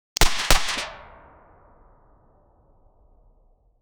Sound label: create sound of gunshot f, pistol